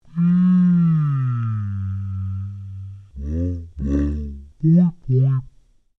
Created Creature Large Vocalise, Alien Sound Effects; Created creatures, vocalisations and pretend speech